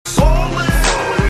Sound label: text tone